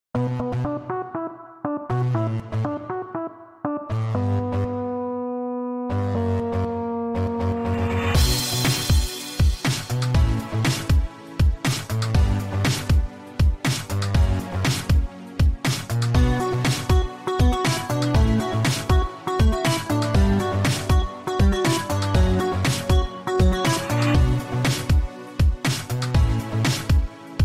INSTRUMENTAL

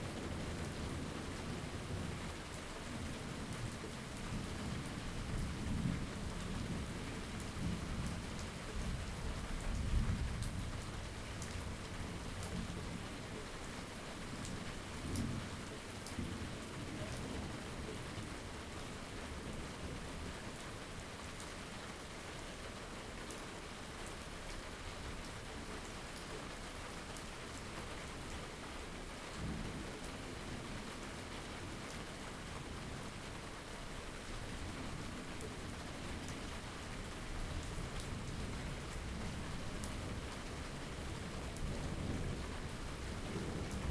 Sounds of Rain Thunder Storms, HD Rain

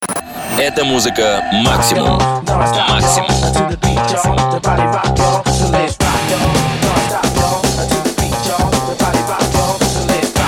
Jingle Radio, Beatmix; Radio Maksimum

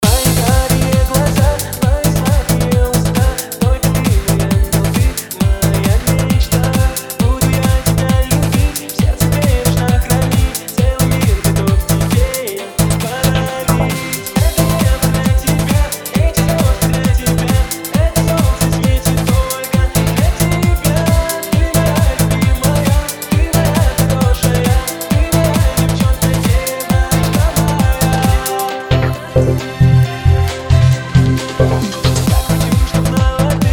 Remix

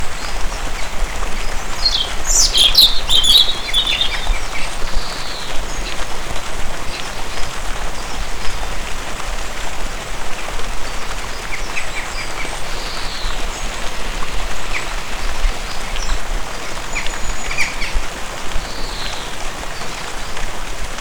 White Noise Spring Rain; Outside Broadcast Recordings

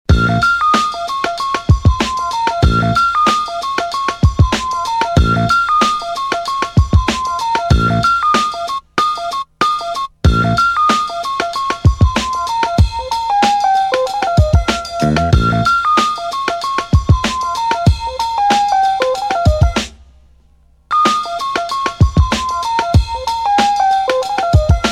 instrumental

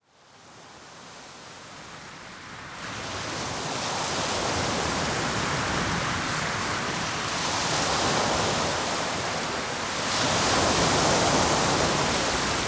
Sea Waves; Sea Waves Sounds Ocean Sounds Nature Sounds

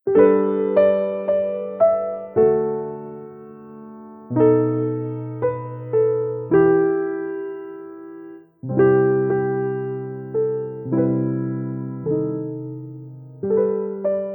Calming Piano

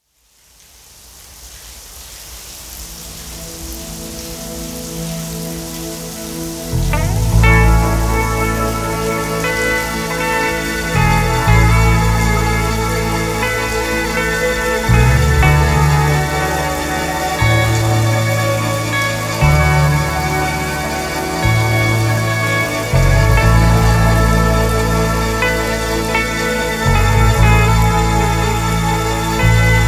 Instrumental

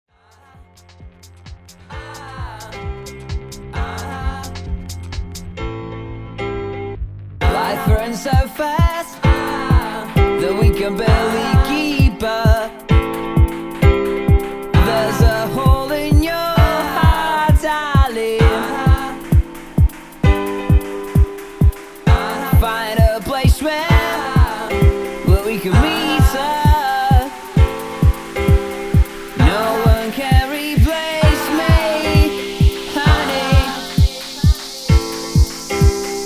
set mix

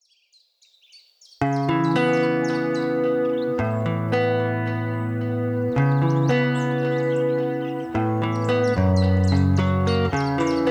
Acoustic Guitar Melodies

